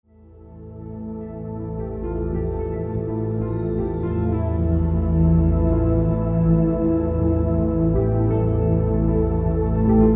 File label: Spanish Winds; Drum-atic Atmospheres